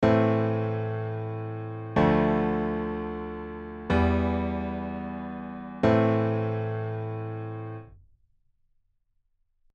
standard cadence

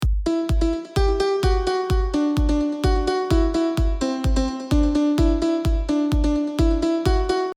self melo solo

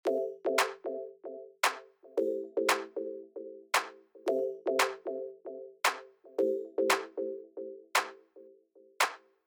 no drive

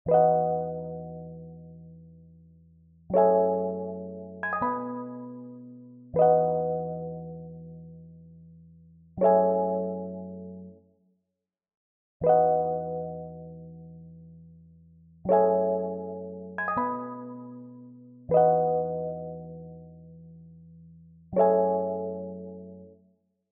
Chords

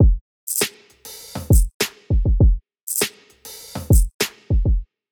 Choke

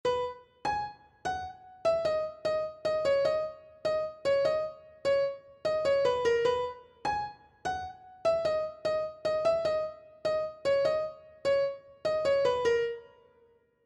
chorus pluck

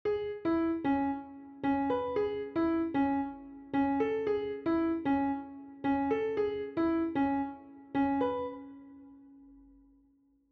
melody

